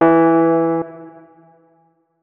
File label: notes, keyboard, electric piano